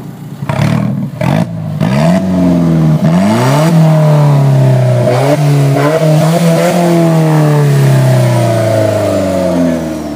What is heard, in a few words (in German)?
Alfa Romeo Sound hohe Drehzahlen